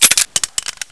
beretta clip out, weapons; AoMClassic

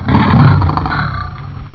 pain, monsters; AoMClassic

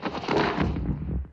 intro fall; player

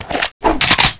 shotgun pump, weapons; AoMClassic

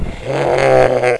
attack, monsters, handcrab; AoMDC

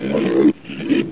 idle, monsters, screamer; AoMClassic

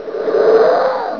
pain, monsters screamer; AoMClassic

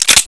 beretta slide, weapons; AoMClassic

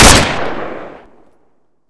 deagle fire, weapons; AoMClassic

